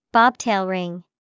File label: bobtail ring